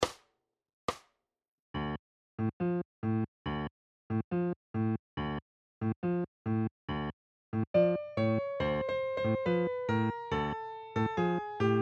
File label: Habanera; Hity klasiky 2